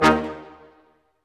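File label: BRS Large Ensemble, Staccatos; Roland LCDP08 Symphony Orchestra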